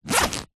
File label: backpack small zipper